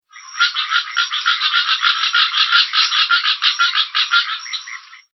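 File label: unknown amphibian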